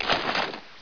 draw, weapons

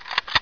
k1a draw, weapons

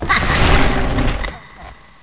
pump in, weapons

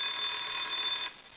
ALARM; Bush

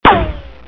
hook

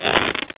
hook rope

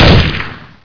m4a1 shoot, weapons

